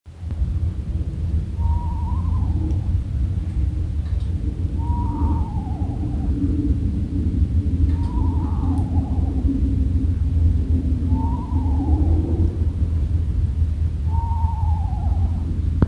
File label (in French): Petit Duc macule; Jonathan Irons